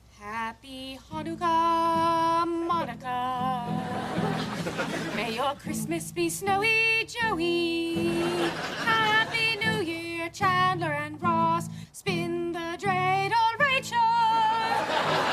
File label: Christma Song